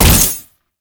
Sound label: Layer Shot, Quill Dart Gun; Hystrix Prime